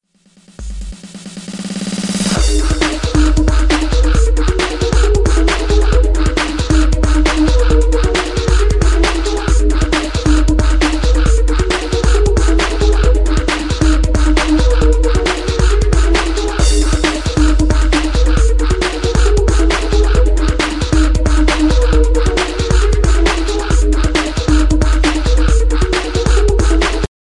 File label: Minimal Ringtone